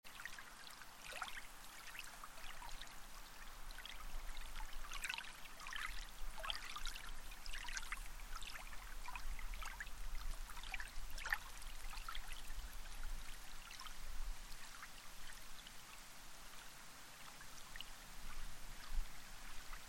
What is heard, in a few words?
Water Wind